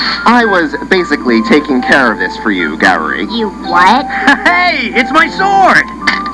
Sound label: sword; Happy to get his sword back!